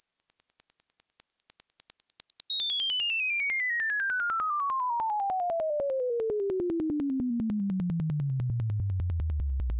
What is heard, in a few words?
sweep